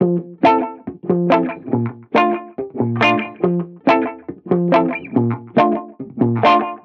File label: Reggae Guitar Loop 140 BPM F Maj; Vibrations; Cymatics; Vibrations Guitar Sample Pack